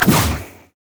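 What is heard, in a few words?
blue minion wizard basic attack; LoL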